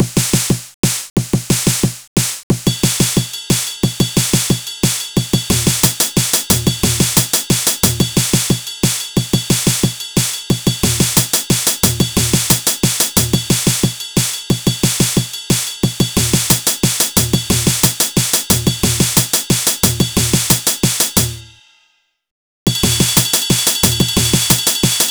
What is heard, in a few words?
drum